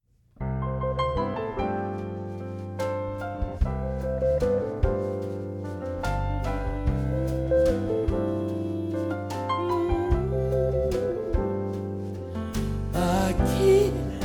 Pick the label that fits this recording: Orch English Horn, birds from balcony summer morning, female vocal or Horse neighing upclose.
female vocal